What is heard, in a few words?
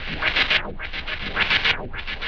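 Arpeggio Fx, 105bpm; rhythmic inspiration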